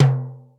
mid tom single hit c key

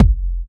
round kick single shot g sharp key

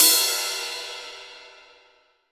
crash cymbal sound e key; drum sample; one shot

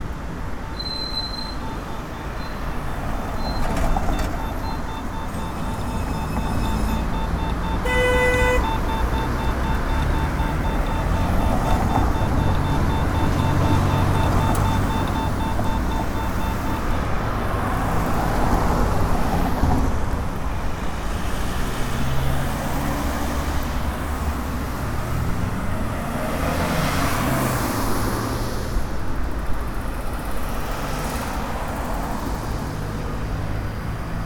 city square with traffic and deaf lights; asharp fsharp csharp

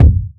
nice kick sample d sharp key; kick drum samples

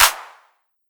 roomy clap e key; percussion; single hits one shots

